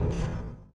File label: ladder, footsteps walk; boomer